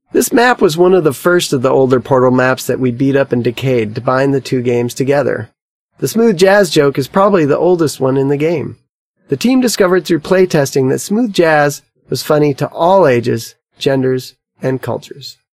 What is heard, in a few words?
jazz; Portal 2; Source Engine; video games; commentary